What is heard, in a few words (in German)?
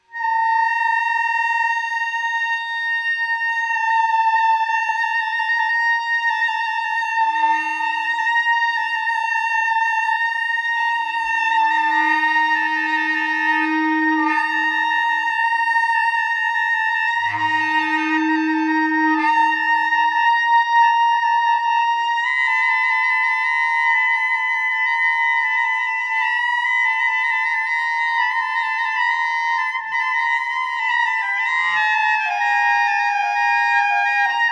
es und bas cl